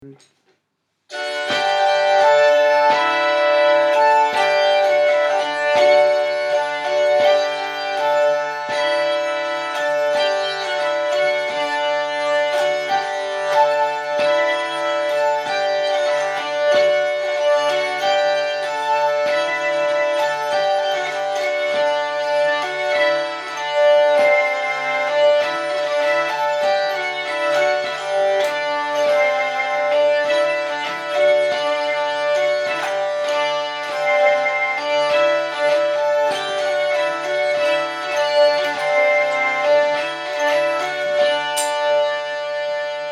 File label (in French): Bourrée 2 temps - mélodie lente; Bourrées 2 tps